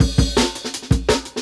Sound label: 168, Jungle Breaks; Tube; Original Jungle Breaks